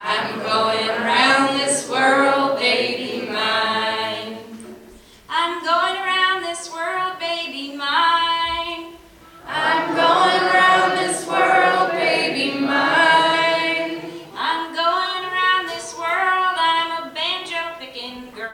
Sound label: Banjo Pickin, musicbox